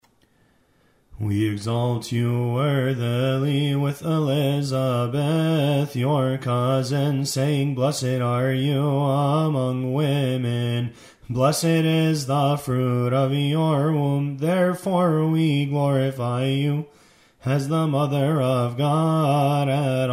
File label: gospel response